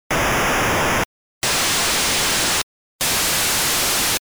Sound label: noise